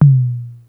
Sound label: lowtom, Kawai SX synths